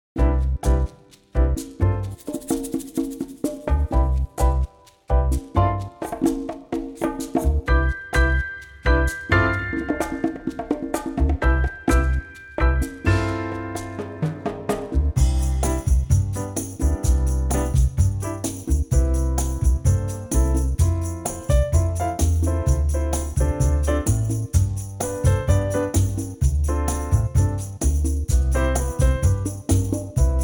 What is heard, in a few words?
C bossa; key - C - vocal range - G to G